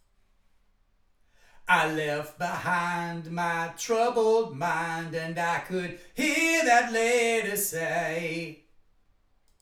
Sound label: vocals